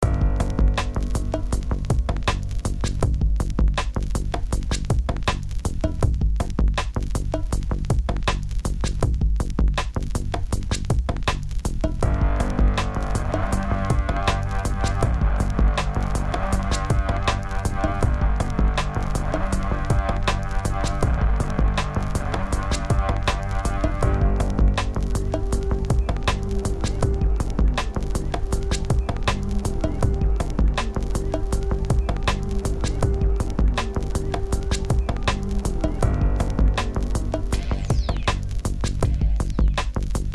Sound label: bgm nervous; kardia fantasy mamono hime tachi to bouken monogatari; android